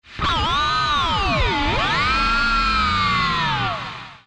screaming, guitare harmoniques

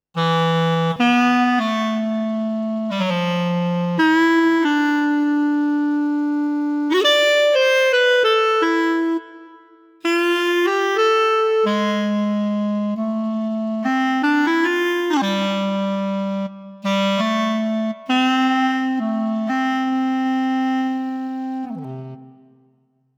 tyros3 sound clarinette, yamaha

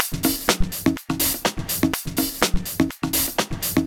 boucle dry; cakewalk project52

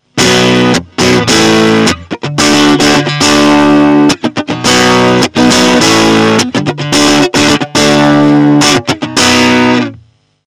strat sat manche; vol12; fender champion600

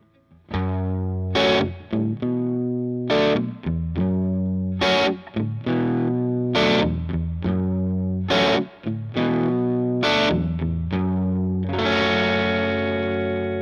bogner clair sans rien manche crunch; bogner alchemist

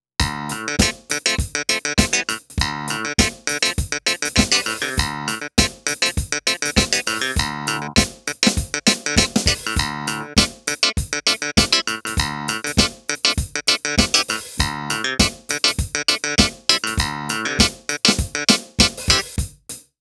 son clavinet; korg M50 61; workstation

